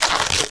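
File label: RUBBER GLOVE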